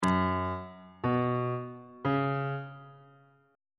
f sharp suspended 4th triad chord intervals on bass clef